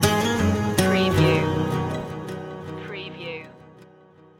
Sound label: summer bike ride stinger